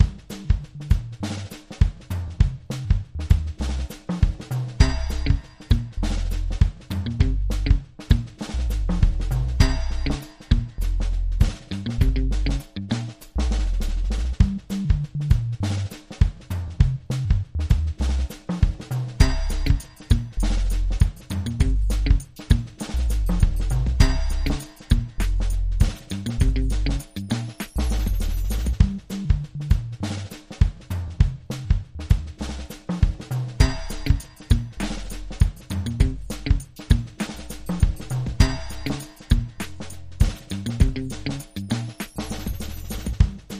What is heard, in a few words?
Hip Hop Fearless Bass